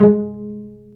Vcs Pz dry; STR Vcs Marc-Piz

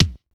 Hi Kick

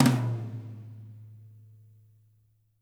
TOM, WARM+FAT KIT; Spectrasonics - Burning Grooves CD2

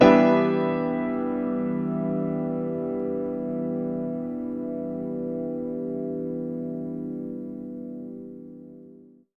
Piano stack (E) min (long chord); Single Shots; Misc; Sonic Foundry (Sony Creative Software); Ugly Remnants VOL-2

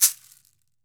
CAXY, CAXIXI; Brazil

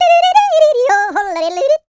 YODEL; ILIO; Vocal Planet

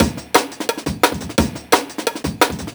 DNB BREAK

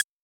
Wurlitzer Swinging Rhythm Clave

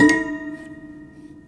Prepared Piano; Battery 3 Sample Kits

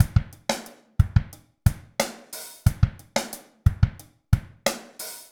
90 slow, loops, hyper dance; Best Service

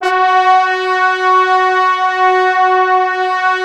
F#3, Orchestral Brass; Best Service ProSamples vol.20 - Orchestral Brass; AKAI

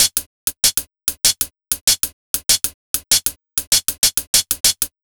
97 DRMS, Pure Electro; USB Soundscan vol.03; AKAI

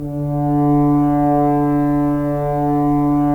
Solo F.Horn, Roland LA Composer; Roland - LA Composer vol. 4

